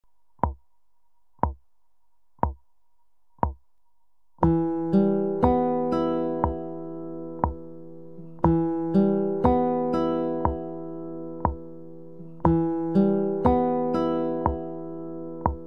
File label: picking; Picking Nr. 1